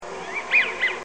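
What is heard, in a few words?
American wigeon, birds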